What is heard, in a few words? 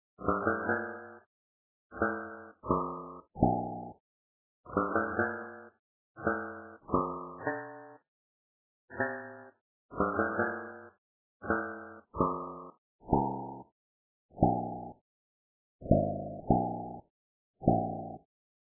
steelplate; Terminator